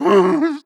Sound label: warning, zombie vox; creatures